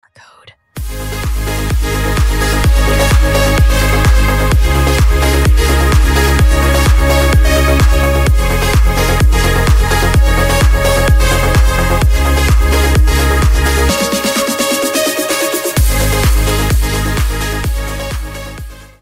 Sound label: Instrumental, sonnerie